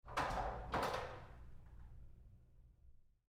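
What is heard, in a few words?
Door Bathroom Stall Lock Roomy Moves Light; Big Room Sound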